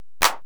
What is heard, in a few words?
clap